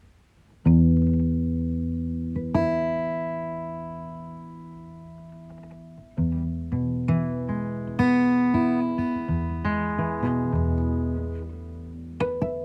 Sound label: Meditation